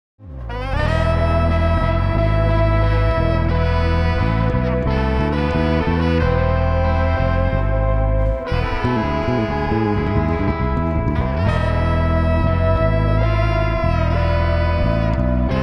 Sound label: DRUM CHALLENGE 90BPM SIN CLICK